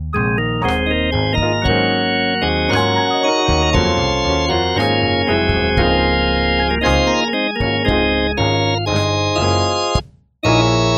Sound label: vox continental combo organ